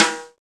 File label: PRC, Rap FM Perc, Roland; Rappin Perc